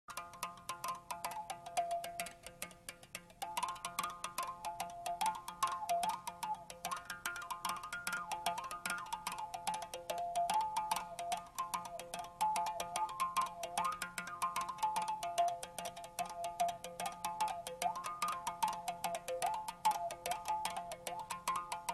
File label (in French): musique primitive arc en bouche; capoeira